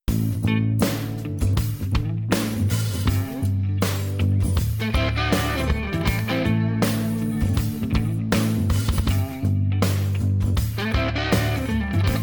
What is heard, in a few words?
Music mellow groove; Super Mega Baseball Extra Innings